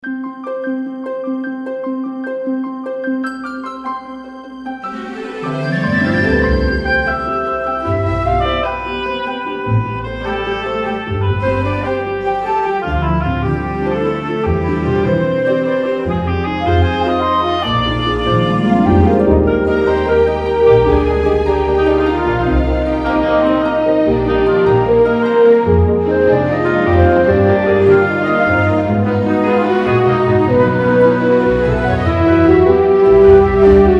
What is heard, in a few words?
Romantic Comedy